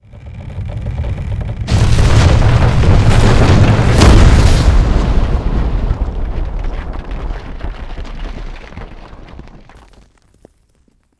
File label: tree crash; sfx boss african